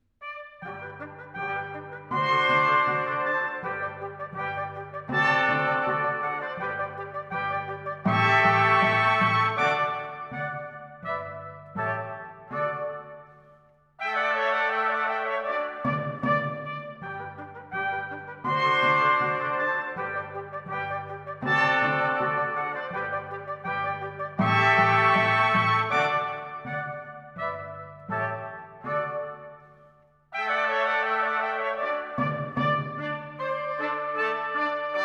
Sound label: Divertimento in C major, for 5 trumpets & 4 timpani - Andante; Mozart; Serenades-Divertimenti-Dances